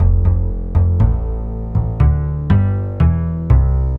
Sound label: Jazz Jazzy Slapbass